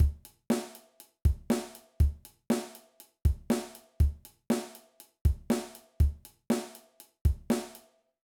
Pop Groove

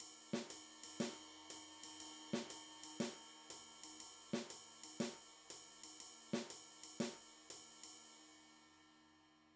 Swing Groove

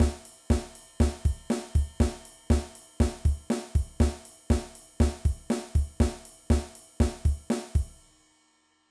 Motown Drum Beat

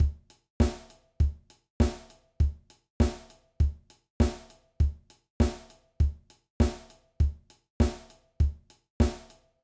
Four on the Floor

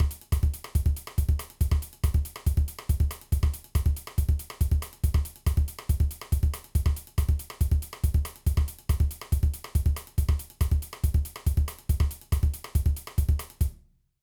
Samba, drum

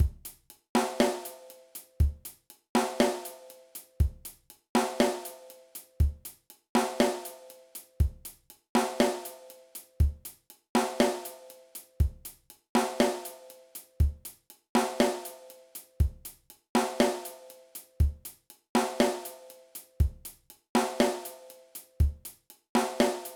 Rim Shots Exercise; learning drums

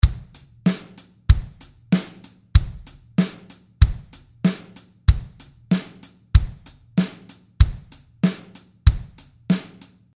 Hip-Hop Drum Beat

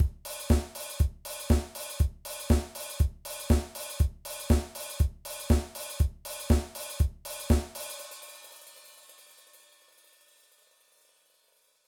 Disco Groove

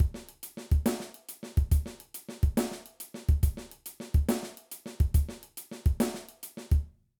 Half Time Shuffle

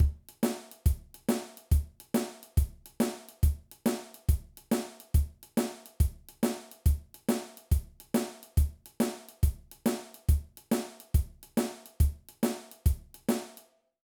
Shuffle

